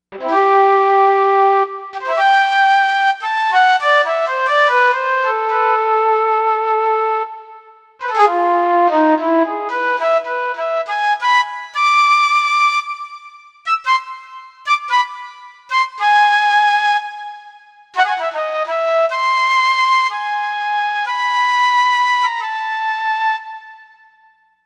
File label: Hard Flute, Korg M1Rex; Factory Patches